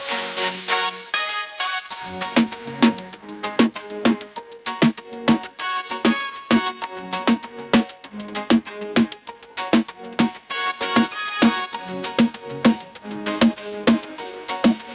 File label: tropical dembow dancefloor, ringtones